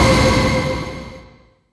Synths C; vengeance essential club sounds-3

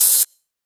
Open Hats, Cymbals

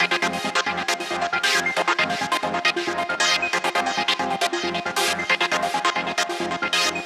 Special Sounds Root F 136 BPM; vengeance essential club sounds-3

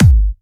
Bassdrums UK Trance Kicks

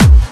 Bassdrums Clubby Kicks; Vengeance Samples

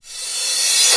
Cymbals Reverse Crash; Vengeance Samples

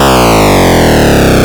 Arcade Riffs

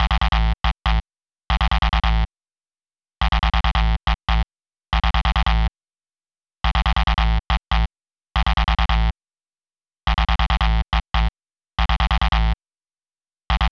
TuneUp A; Vengeance Samples

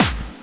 Percussion; Vengeance Samples

